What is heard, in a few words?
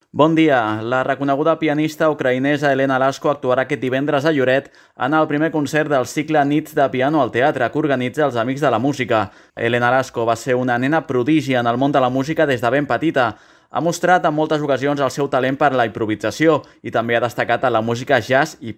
piano